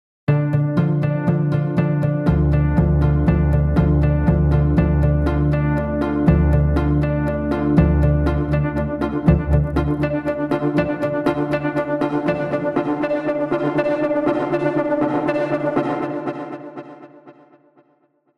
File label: Pluck; Hairs Station 2